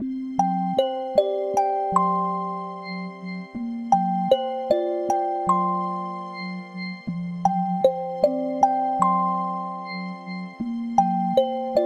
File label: Kalimba Canopy; Samsung Galaxy S26 Ultra; ringtones; Calm